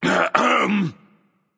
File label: clear throat; smite; voicepack; poseidon